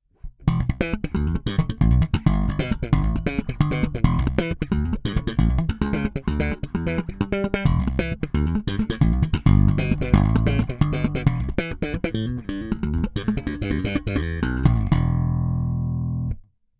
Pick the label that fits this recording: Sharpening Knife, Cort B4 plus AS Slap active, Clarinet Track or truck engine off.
Cort B4 plus AS Slap active